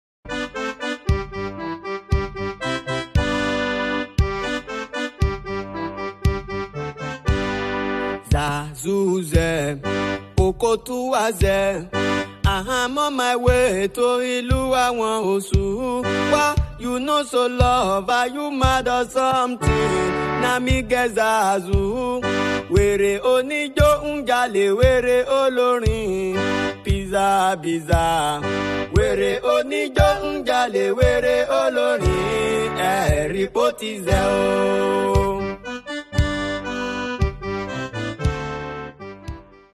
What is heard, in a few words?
Accordion